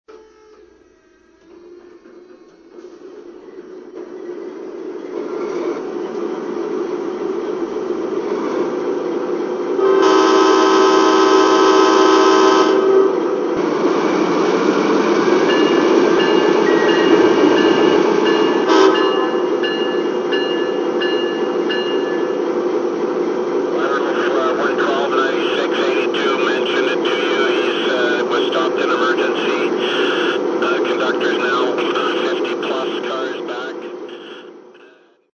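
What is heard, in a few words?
Dash9 5 chime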